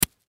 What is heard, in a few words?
Potato Knife Chop, Potato Knife Chop Fast; Food Sound Effects; Household; Foley